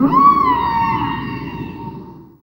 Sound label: FX Dance